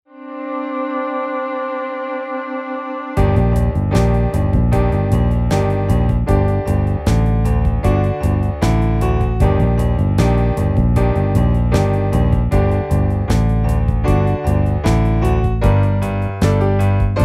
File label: The Piano